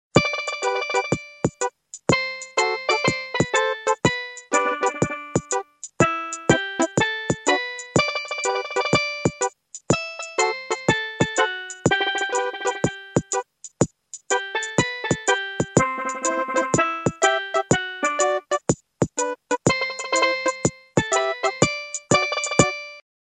Beach, Nokia Tones; Windows Phone apps

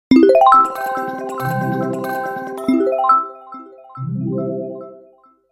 Bowl; Nokia Tones; Windows Phone apps

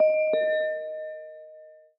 New Message, notifications; Asus FonePad Note 6

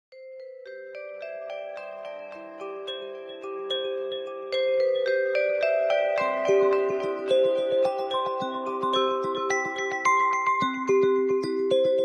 Bell Melody, alarms; Honor; X7a

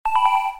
SMS in Call; SV850 Wine 4; LG

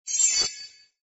Tone, Message; i-mobile; ZAA-1

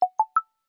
Gentle, notifications

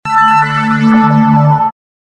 Synth

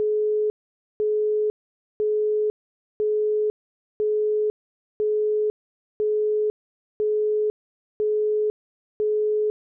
call busy, Samsung SGH-i620

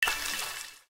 Water fold close; Nokia; audio themes

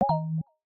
Magic Blip, notifications; Samsung Galaxy S25 Ultra

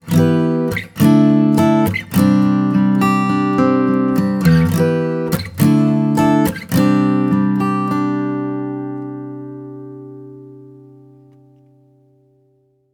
Acoustic, ringtones; Marshall; London KB 1501

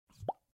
Unlock; Coolpad Note 3

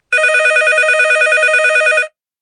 Fixed Telephone, ringtones; Infinix; XOS 15

